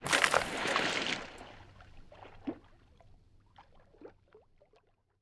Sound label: Water splash bike bog